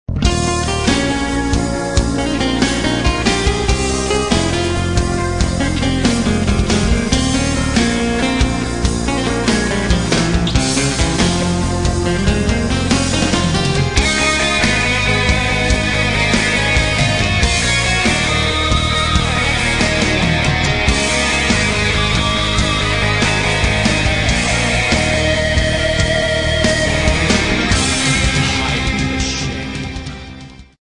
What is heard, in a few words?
human solo